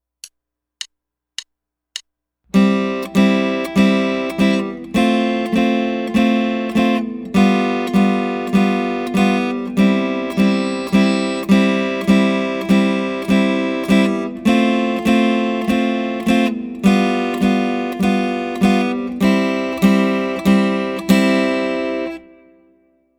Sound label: Small Chord Etude